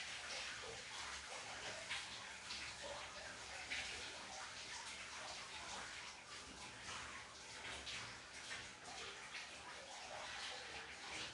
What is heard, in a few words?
shawer